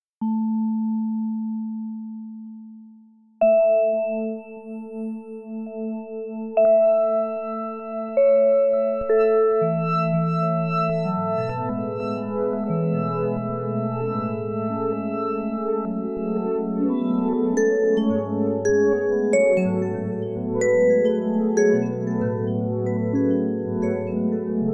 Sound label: EEG whale song